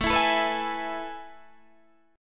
A Major 7th Suspended 2nd, Guitar, Standard; AM7sus2 strummed; JGuitar

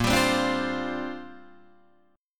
A sharp 6th Add 9th, Guitar Open D

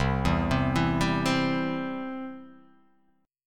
C Major; arpeggiated; JGuitar

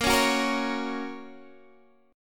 Bb Minor Sharp 5th, Guitar, DADGAD; x,13,11,11,13,11; strummed; chord shape

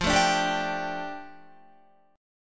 F 6th Add 9th F down Guitar, chord

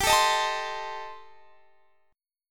D sharp 6th Flat 5th

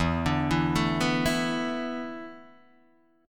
E 7th Suspended 4th, Guitar, DADGAD

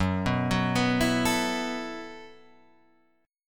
E Suspended 2nd Suspended 4th Gb

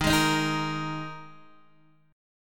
Eb Suspended 4th, Guitar, DADGAD; x,6,6,8,6,8

